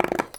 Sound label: Palette Squeak, Wooden Palette